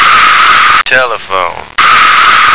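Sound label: telephone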